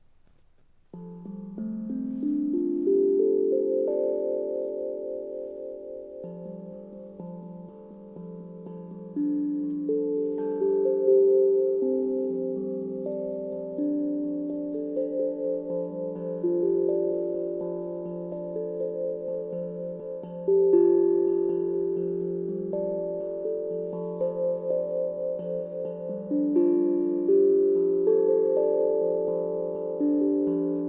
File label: Fa major pent 440